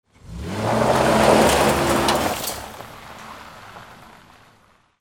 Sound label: CARS